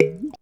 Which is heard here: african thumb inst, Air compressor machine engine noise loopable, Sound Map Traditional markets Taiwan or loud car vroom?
african thumb inst